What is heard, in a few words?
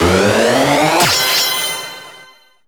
SE GAME SLIDE; maimai